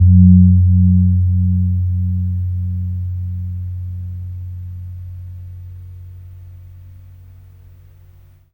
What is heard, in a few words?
KEMPUR F3, KEMPUR GONG; Spectrasonics; Heart of Asia